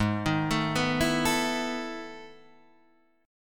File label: E Major 11th chord